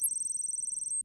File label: data display, button